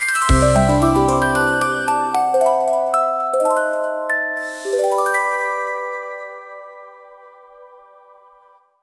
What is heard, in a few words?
sfx glyph found; tillys-quest; investing-dlc